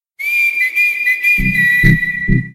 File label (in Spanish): Silbido Corto